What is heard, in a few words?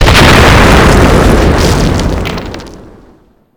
vehicle death explosion combo; WAR